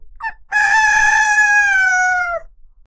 rooster crowing; animal vocalization

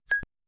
quick asmr sty, metallic ping

